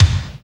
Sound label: KICK; East West; Phat & Phunky